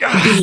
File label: voice male hurt pain